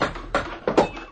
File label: Tower Building